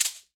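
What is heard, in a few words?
MARACA RIGHT, Latin Hand Perc; E-MU Producer Series Vol. 2; More Studio Essentials; Composer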